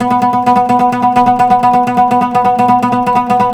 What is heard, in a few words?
PLK OUD, Roland LA Composer; Roland - LA Composer vol. 3